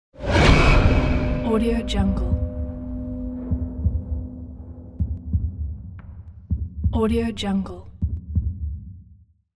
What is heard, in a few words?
jump scare